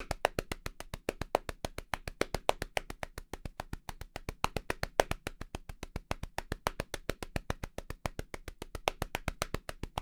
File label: Hands; War Cry (Cayovinto)